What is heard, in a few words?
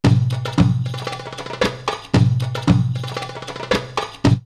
Desert Beat 114, drumloops World Ethnic; X-Static Goldmine